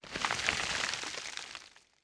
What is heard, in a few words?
Rock Large Debris; Survival Game Sounds - Lite Edition; Environment